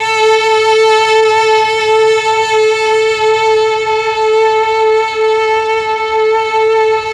MEL STR, Legendary M400; ILIO; Propeller Island

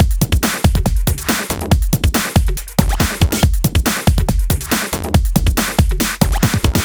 Break Beats, 140 BPM

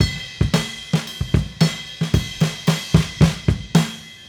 Raw Funk Drums Fill; Sonic Foundry (Sony Creative Software); What it Is! 70s Analog Funk

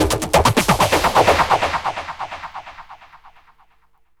WHOOSHES; Megabass Remix! 2

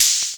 noise, House Misc Perc; E Lab; XTc Files of House

